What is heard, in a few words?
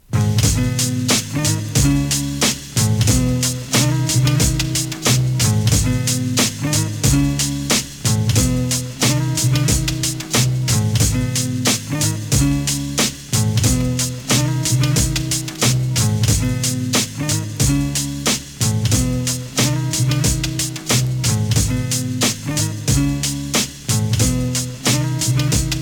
intro instrumental